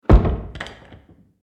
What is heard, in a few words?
Large household door close